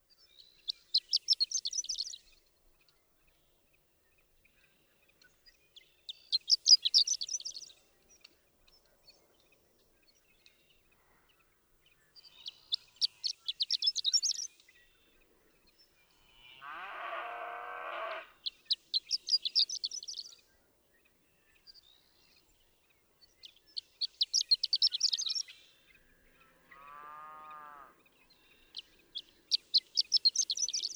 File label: Horned Lark, birdsong